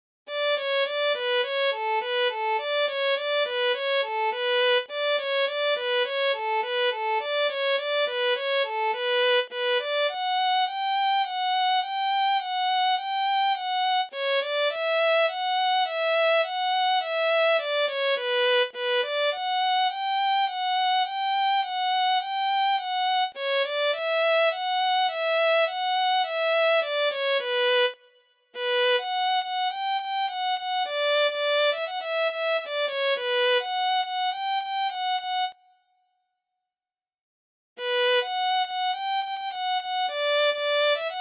Violin

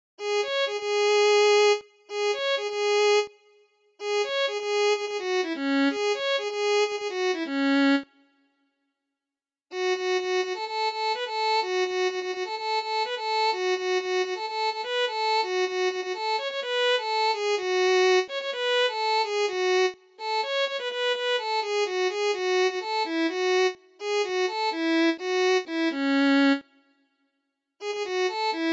Violin

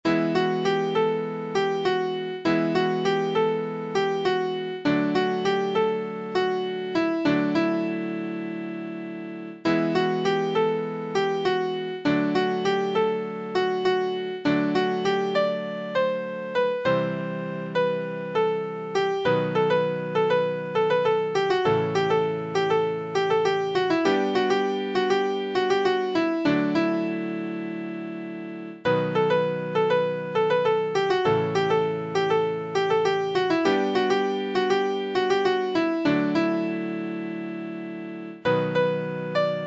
Piano

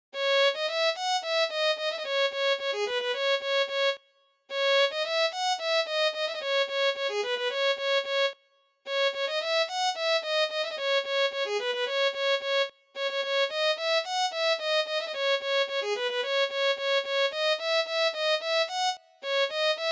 Violin

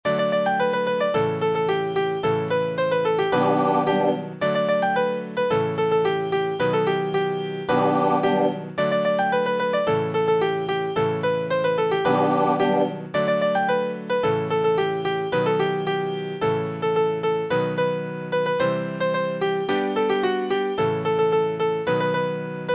Piano

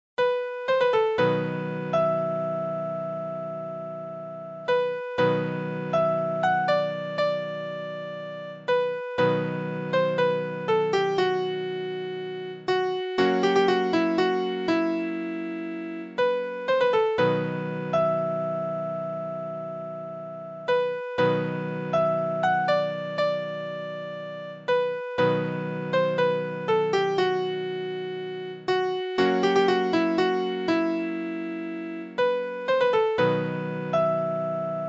Piano

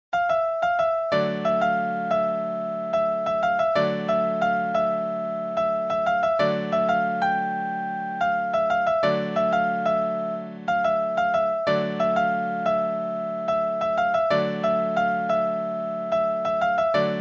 Piano

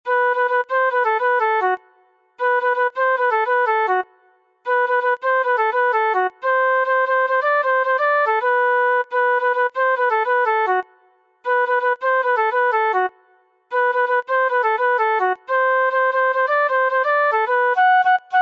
Flute

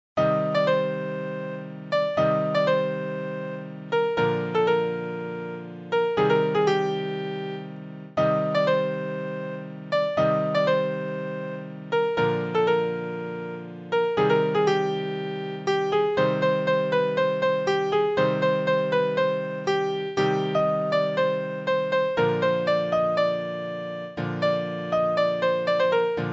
Piano

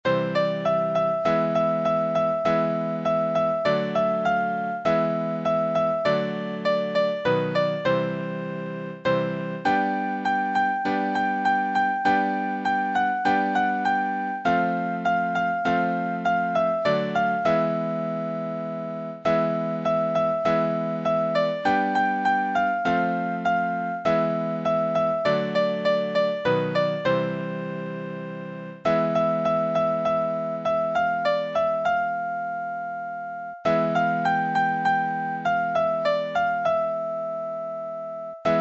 Indian National Anthem Piano

